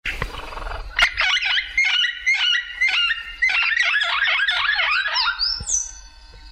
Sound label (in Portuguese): Papagaio da cara roxa